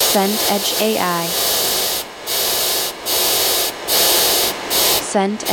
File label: storm squall rain wind